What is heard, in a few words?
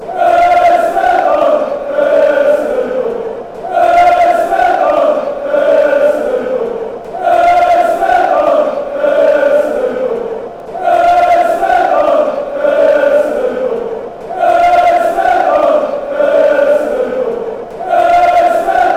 az chant